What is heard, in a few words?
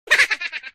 hahaha